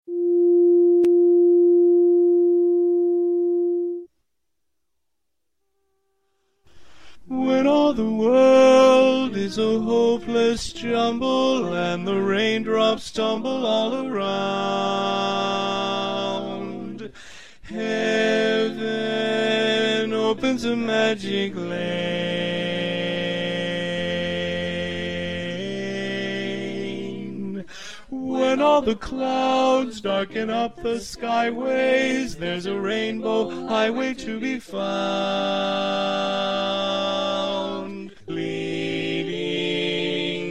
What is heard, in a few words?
Rainbow in F Lead